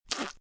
Spit Wet, Spitting/Horking, wet spit (human voice); VoiceBox